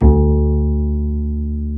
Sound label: Bass, Roland